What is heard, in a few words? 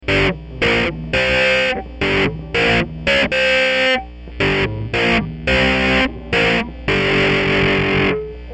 smoke